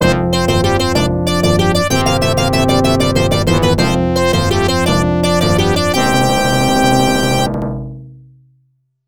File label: Victory Fanfare; Nobunaga no Yabou Haouden; X68000